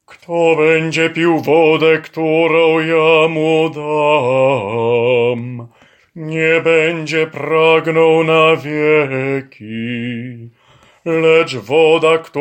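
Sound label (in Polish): Wody Jordanu ALT, choir alto part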